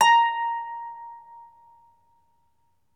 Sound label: CELTIC HA, Celtic Harp